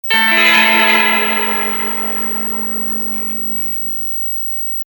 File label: Magic Box